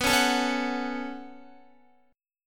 B 7th Suspended 2nd, Guitar; B7sus2; strummed

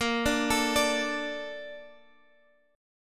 A sharp Major 7th, down; arpeggiated; chordshape

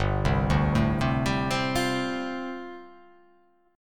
G 7th Suspended 2nd Suspended 4th

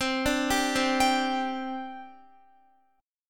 C Suspended 2nd C Guitar; arpeggiated; Csus2; x,15,12,12,12,14; 4E,4A,5D,5G,5C,6F

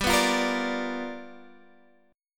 G 7th Sharp 9th, Guitar Standard; G7#9 strummed

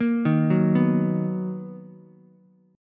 B Major 7th B down Ukulele; arpeggiated; JGuitar

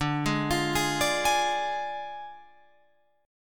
D Diminished D, Guitar, Open F; arpeggiated

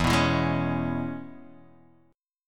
E 6th, down

